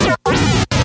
Droid Robot

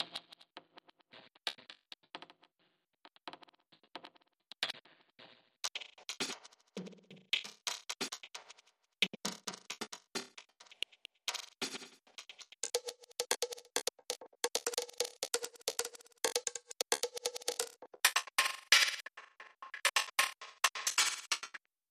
Loop Layer 4 Glitch

